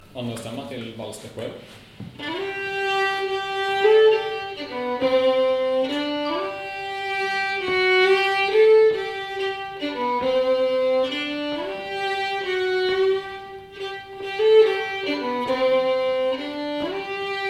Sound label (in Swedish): Vals 2a st; Vals de Poeles